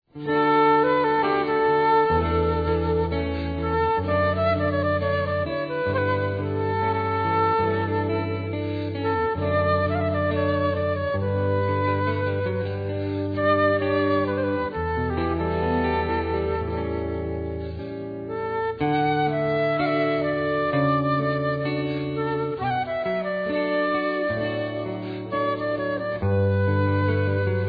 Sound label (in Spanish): oboe